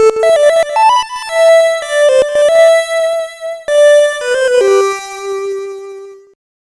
The Soldier's Flute; Dragon Slayer Eiyuu Densetsu 2; PC Engine CD

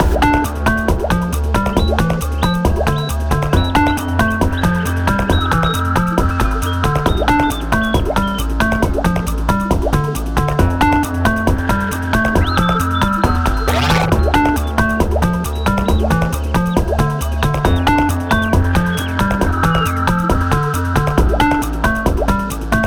Boss w drumz; BlueberryGarden

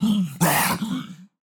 Death Vo; Magic Shrek

